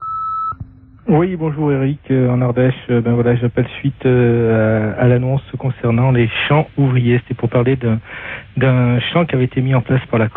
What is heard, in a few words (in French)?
Chant de mineurs repondeur